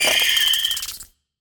Flying Insects, death

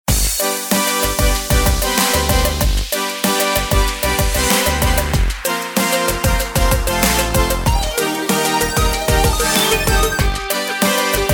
Victory Tune; vortex attack ex windows gamerip 2019

